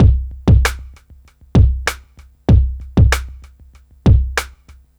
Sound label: beat, Roots Funk; USB Soundscan vol.41; AKAI